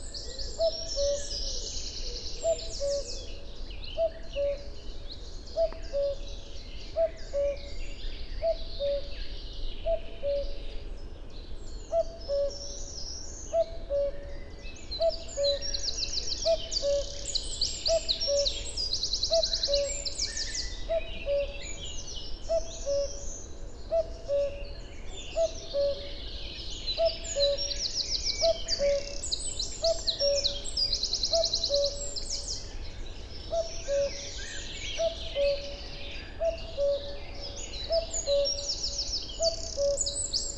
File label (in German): Mono Natur Kuckuck; Wildtronics-PMSt